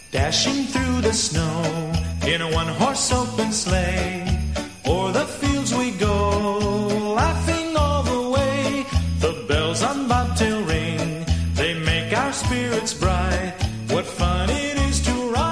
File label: jinglebells; xmas